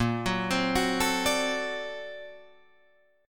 Bb Augmented Major 9th Bb down Guitar Open D

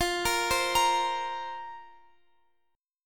F Suspended 4th F down Guitar Open F; Fsus4; arpeggiated

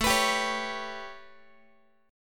A 9th A, Guitar; strummed; JGuitar

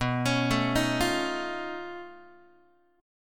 B 9th Flat 5th B down Guitar; B9b5 arpeggiated; JGuitar

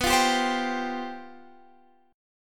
C Major 7th Suspended 4th B

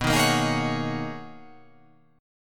B Major 13th B down

